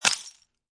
Glass Debris Smash, Impact, Glass Debris Smash, Crushing Broken Glass